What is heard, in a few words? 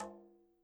CL rm s, KILLER CONGA